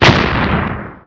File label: bomb; Fortress2; OnlineGame